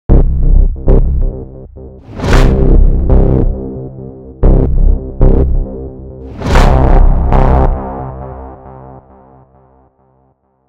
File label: briefing ambience